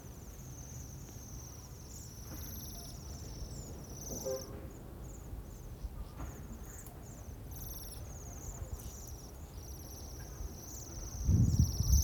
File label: Bohemian waxwing; پرنده بال لاکی بوهمی